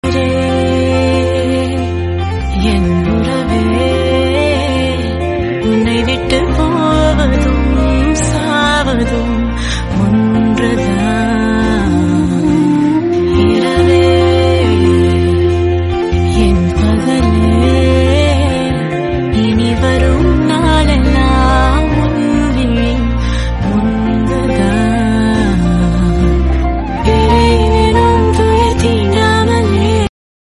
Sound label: tamil song